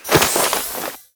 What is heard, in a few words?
Cover Cast; Warframe; The New War; Kahl; Abilities